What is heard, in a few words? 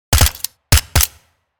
M4 assault rifle suppressed fire; gfxsounds